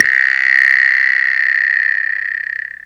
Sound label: RAZZ, cartoons